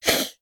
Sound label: naga hiss